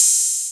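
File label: hi hat open, Yamaha TG-500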